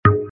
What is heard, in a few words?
synth wood